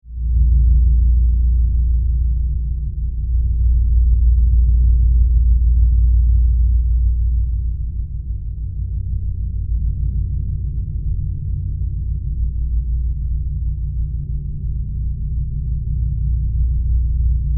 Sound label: Spaceship Interior, Spaceship, Interior, Rumble, Drone